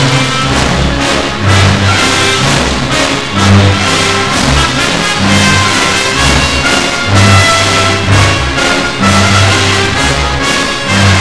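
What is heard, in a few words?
natural trumpet; Sergei Prokofiev: Peter and the wolf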